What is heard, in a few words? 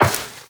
Step Metal Perforated, Footsteps, Walk; Warframe; Characters; Tenno